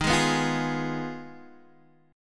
G sharp Minor 6th D sharp

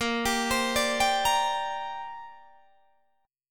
Bb 6th Add 9th Bb Guitar Open D

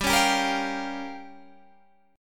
Bb Minor 6th, guitar

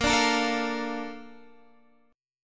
Bb Major 7th, Bb, down, Guitar, DADGAD

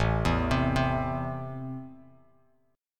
A Minor, Bass Standard; 5,7,10,5; JGuitar

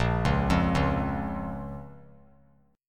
A sharp Minor A sharp down; arpeggiated; JGuitar

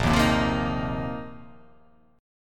Bb Suspended 2nd Suspended 4th, Bbsus2sus4 chord; strummed

